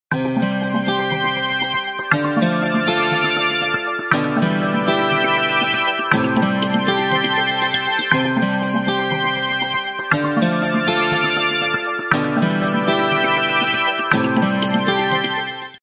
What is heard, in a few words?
nokia string guitar